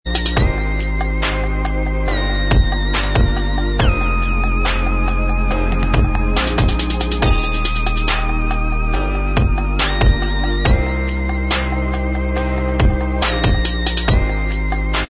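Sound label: instrumental track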